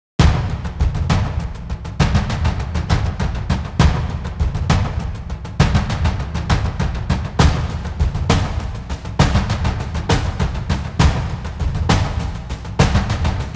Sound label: coastal explore percussion